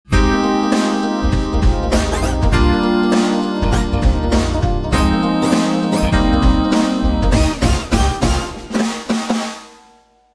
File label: Pop